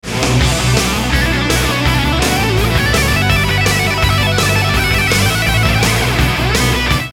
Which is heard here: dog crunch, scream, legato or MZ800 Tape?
legato